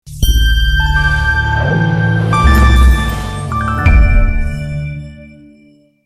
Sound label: Chime; Ubisoft Chime; Assassin's Creed Brotherhood